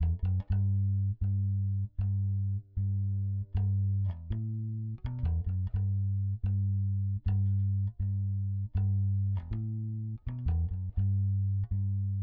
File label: bass